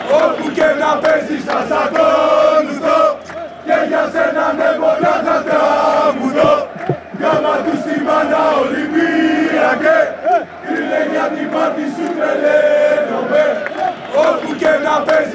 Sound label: chant, fanchants